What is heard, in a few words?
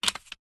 leisure game plastic panel set down on game board; rubiks race